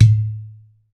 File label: UDU TONE, African Perc; E-MU Formula 4000 Series Vol. 4; Earth Tones